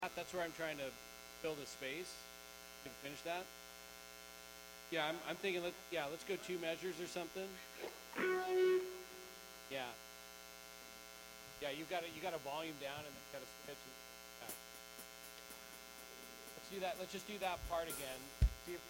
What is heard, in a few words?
Buzz and Feedback Mix